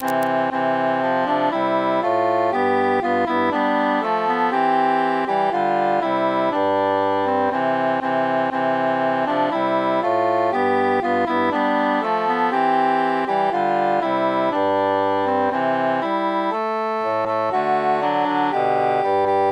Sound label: altus mute; since first i saw